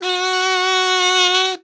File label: KAZOP G4, KAZOO; Xsample VOL-9